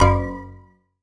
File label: draw; game scissors